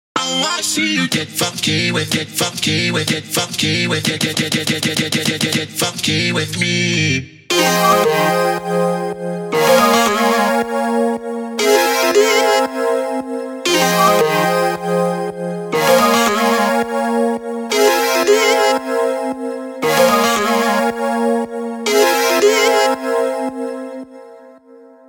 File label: Get Funky